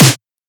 snare oneshot; GhostHack

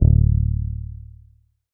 Bass Guitar D; Basses; Cymatics; Eternity Sample Pack

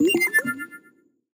Retro FX; Cymatics; Video Game Sample Pack Vol 1

